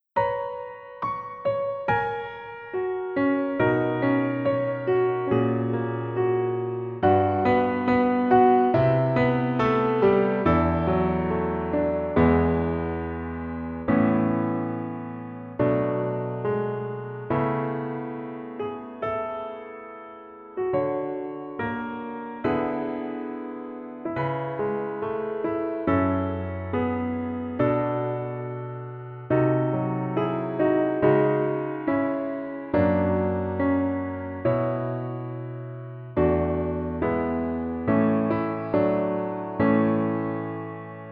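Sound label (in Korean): Piano version -1키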